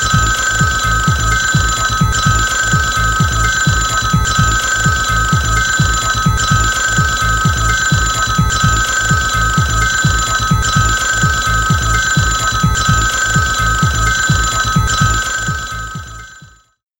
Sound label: RINGTONE